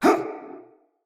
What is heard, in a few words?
Pain, Battle; Mendicant Wazard